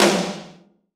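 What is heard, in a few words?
TOM HI, drum machine; Drum Mashines; EdgeSounds; JD DRUMS KIT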